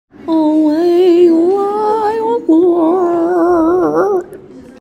christmas song

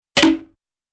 bee doop

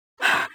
breath light

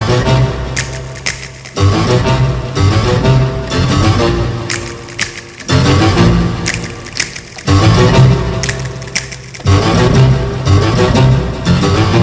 piano

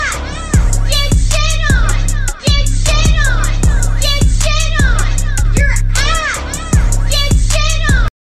trap remix

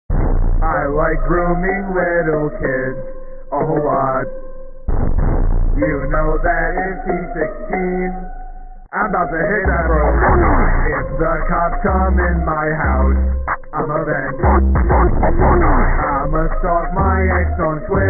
low quality emo

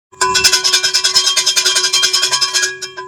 cowbell

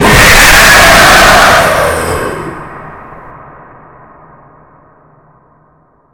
screamer; phantom leopold

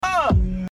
uhh

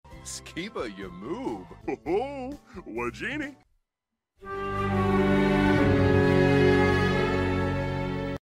bad interaction; sims

